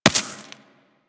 Laser Impact Generic; Surface

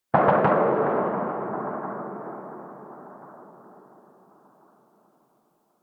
Distant Gunfire; Warframe; Ambience; PVP

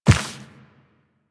Walk Dirt, Footsteps; Warframe; Operator

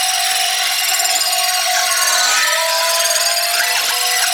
Corpus Ship Data Scan Loop; Ambience; Props; Warframe; Corpus Ship Remaster

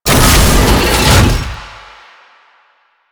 Tank Turn Half, Movement; Warframe; Lasrian Tank

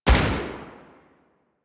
Rifle Shot C; Sundial

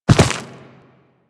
Land Soft Dirt, Footsteps; Warframe; Operator

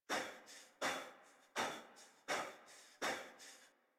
Breath Heavy Fast, Adult; Warframe; New War; Adult Operator

